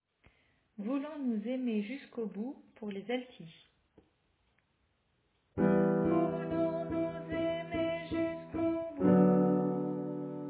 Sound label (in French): Alto